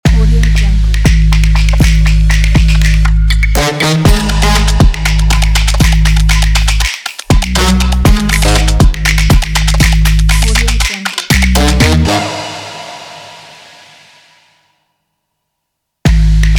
action typo percussion logo

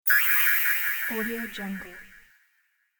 wrong answer cartoon

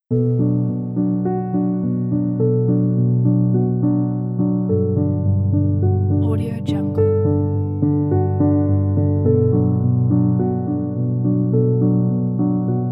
calm documentary music

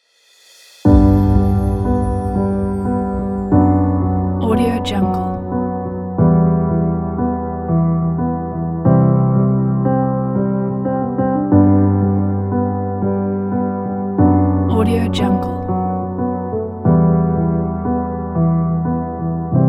nostalgic

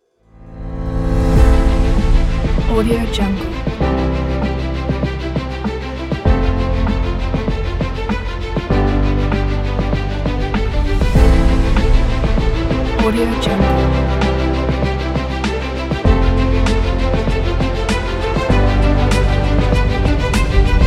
epic hip hop sport

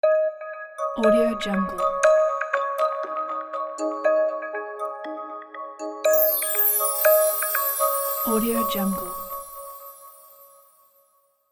magical chinese countdown; soundwood

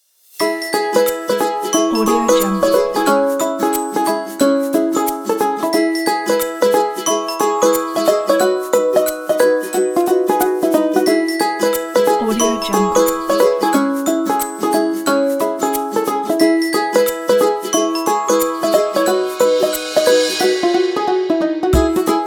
fun acoustic

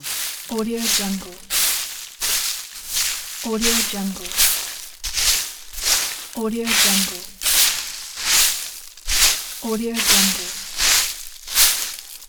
broom

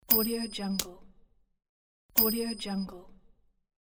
coin toss; aetherfx

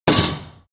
construction foley clank metal massive

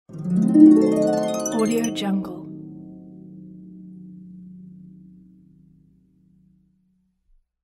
magic harp

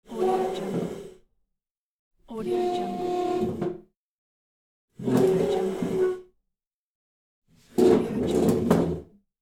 metal chair movement

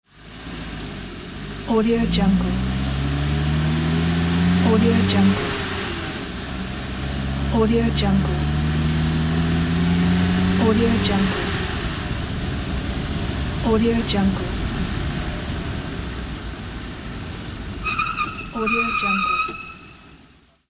car driving; kotanjian

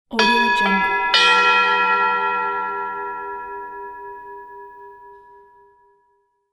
triple loud mass gong

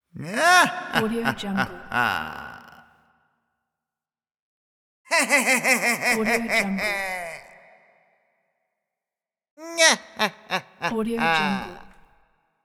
evil laugh

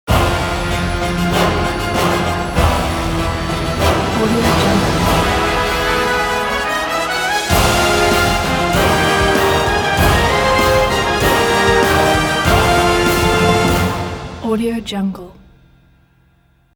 victory logo

